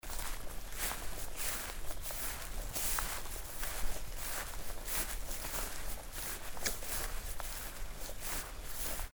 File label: steps on a forest path